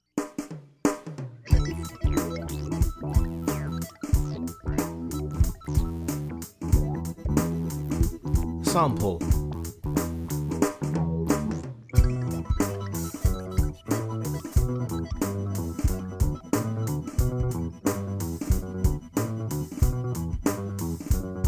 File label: Swamp Hop